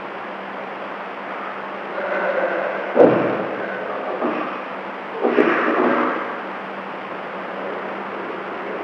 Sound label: 2 shots plus